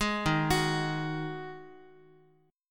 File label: D sharp Minor Double Flat 5th D sharp, down, Guitar; arpeggiated; JGuitar